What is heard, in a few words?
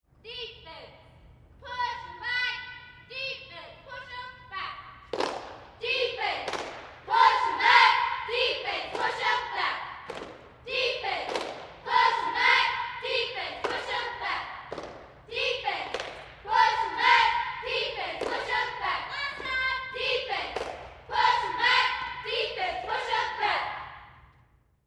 Cheerleaders High School; Cheer 4; Defense Push Em Back Interior Reverb